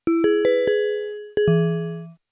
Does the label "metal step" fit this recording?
No